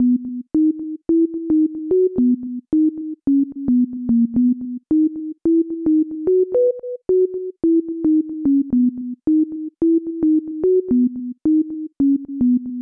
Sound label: ringtone